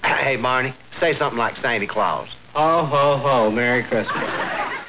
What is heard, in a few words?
ho ho ho